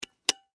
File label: Pesticide Can Open, Extermination, Pesticide, Can, Open, Insect, Bug; Sound Effects:Animals:Insects; Blastwave